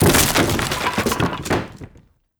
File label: wood gib; Rust